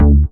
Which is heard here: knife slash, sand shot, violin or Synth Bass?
Synth Bass